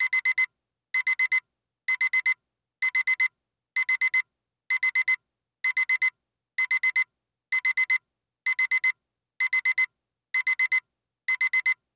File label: alarm clock digital